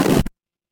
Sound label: transition glitch